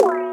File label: sfx; Breaks N Beats Sound Kit; Breakz Kit